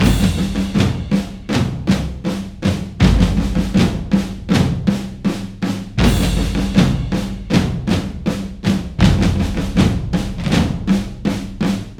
Drums; For Honor

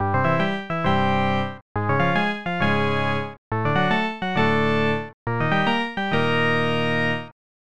Stadium Organ; ESPN National Hockey Night; snes

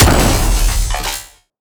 Revolver Charged

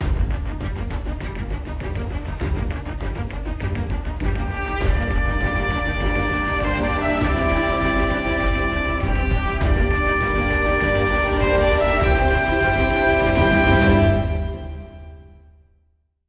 Mission Started 100BPM; Atlas

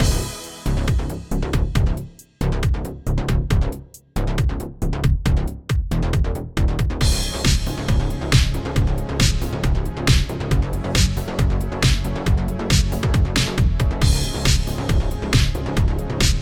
Breakout Psychedelic Mix Stem Layer; Breakout Recharged

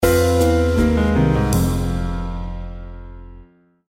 Defeat; Soda Girls

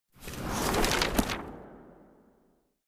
Book Open Front

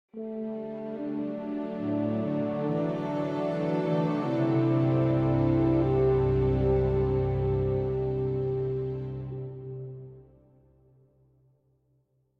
Location Jingle New Region; theHunter: Call of the Wild

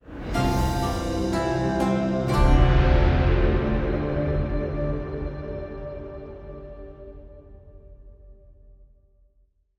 Stingers sting token

